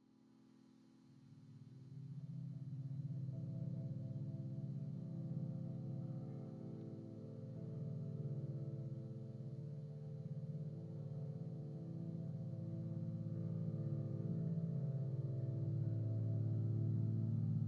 bodytones, electric, Pentatonic, amped, overdriven, murmuring, oceanic; Eiravaein Sound; Yvaine